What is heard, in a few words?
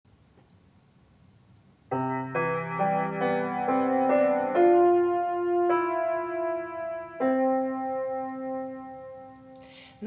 Mom Birthday Song